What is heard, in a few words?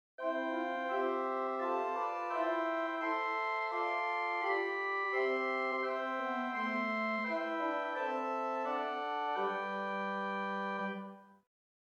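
Swell Salicet 4, organ; Hauptwerk; St Annes Moseley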